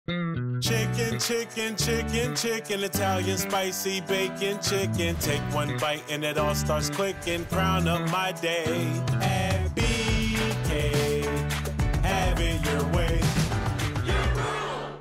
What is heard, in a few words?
music